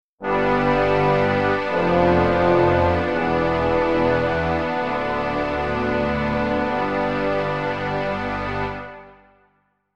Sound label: Strings and Brass